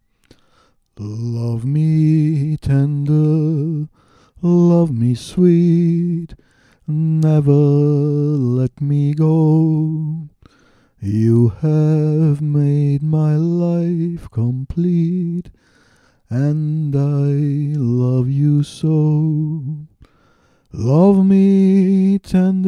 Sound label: Gesang